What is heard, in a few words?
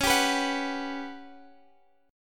G Major 7th Flat 5th C sharp